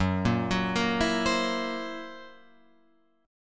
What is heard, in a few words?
Gb 7th Flat 5th Gb down Guitar Open F; arpeggiated; JGuitar